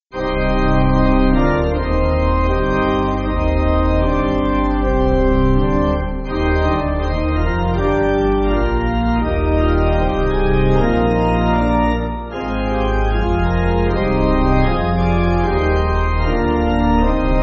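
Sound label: Pipe, Pipe Organ, Backing Files for Churches; Fierce Raged; White Gates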